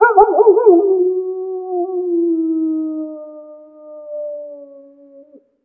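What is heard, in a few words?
animal wolf distant; Call of Duty Modern Warfare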